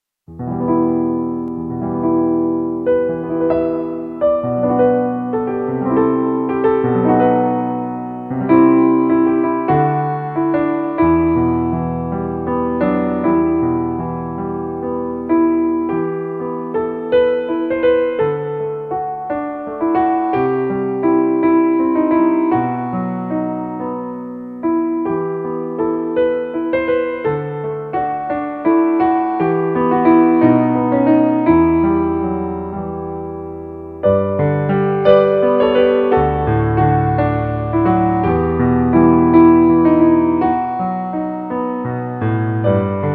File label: greensleeves piano